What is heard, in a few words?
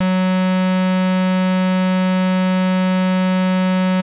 square, 808, SubOsc